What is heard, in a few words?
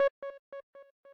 arp